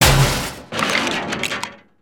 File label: Metallic; destructibles